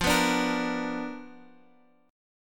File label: B Minor Major 7th Flat 5th F, chord